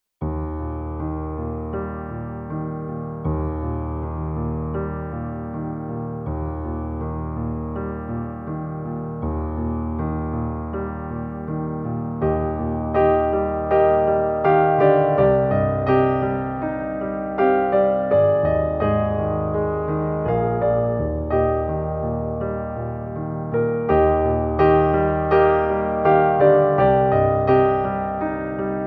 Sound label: piano only